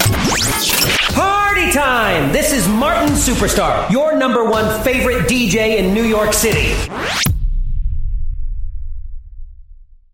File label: jingle